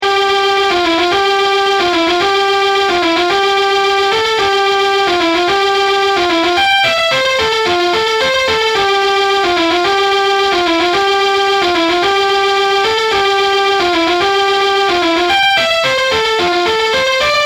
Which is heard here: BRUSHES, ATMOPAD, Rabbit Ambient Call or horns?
horns